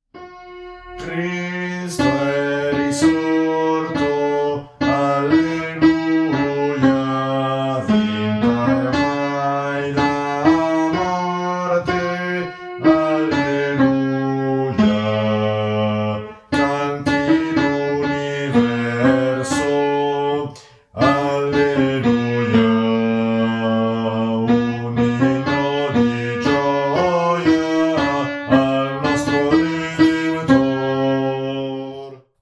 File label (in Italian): contralti; Cristo e risorto